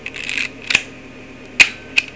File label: olympus 35 sp